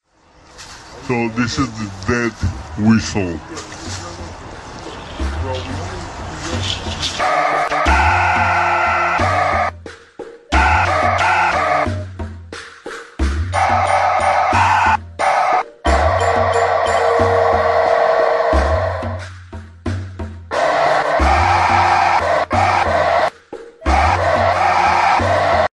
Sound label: death whistle